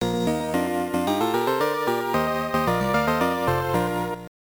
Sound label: Checkpoint; super cobra; msx; scc